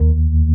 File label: ORGN 123, Keys; Houseworx